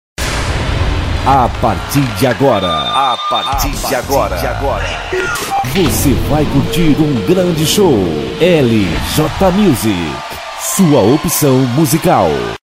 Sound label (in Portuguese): abertura